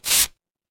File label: paper fabric tear